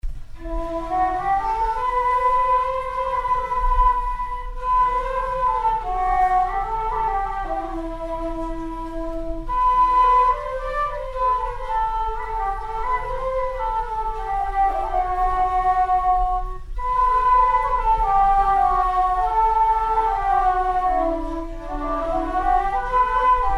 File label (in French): la mantelle melodie flutes; charivari